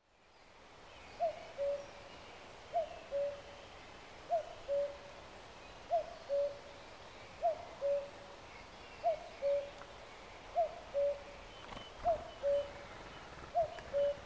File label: cuckoo